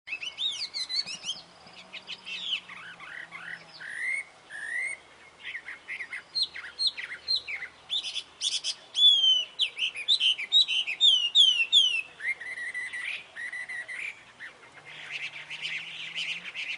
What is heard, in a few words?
hwamei taiwan